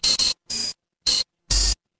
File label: feedback confirm